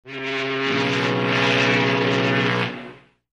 gudok teplohoda

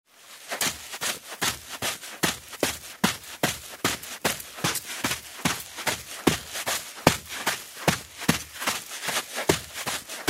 beg po pesku

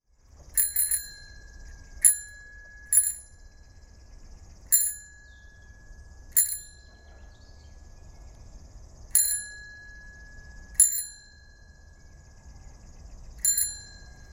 dzyn velosipednogo zvonka

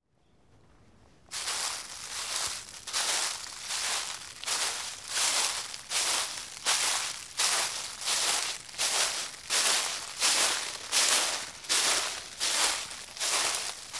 listja shurshat pod nogami

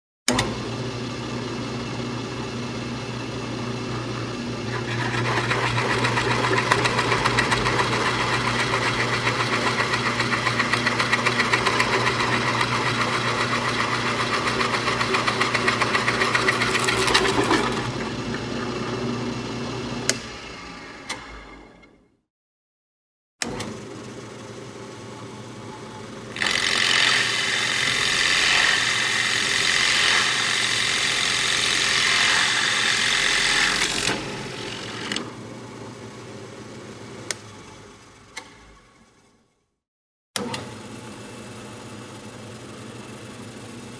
sverlilnyj stanok

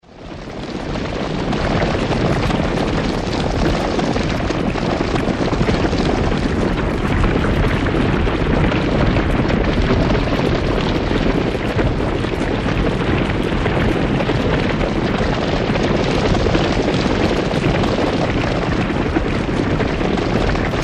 probegaet stado zhivotnyh

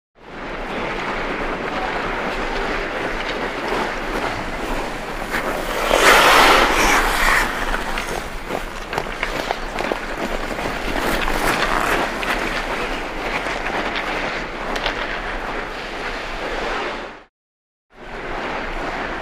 konki hokkeistov

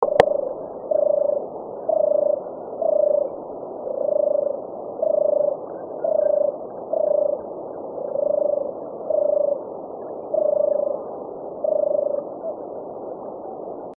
zvuki penija gorlicy

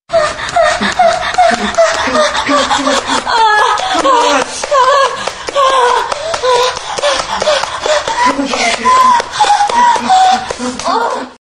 dovedenie do orgazma

